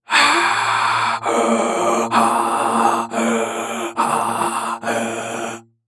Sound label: Human Breathe Through Tube